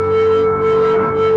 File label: Feedback